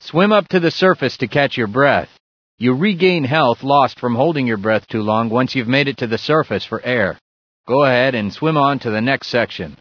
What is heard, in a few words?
holo breath; source engine; bshift; ba holo